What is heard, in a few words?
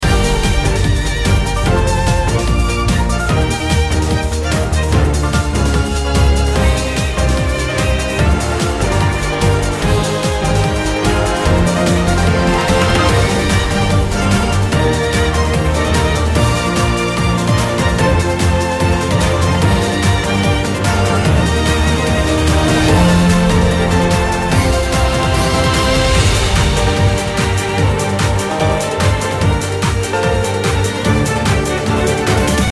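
BGM special dungeon; dragon heroes shooter rpg; android game music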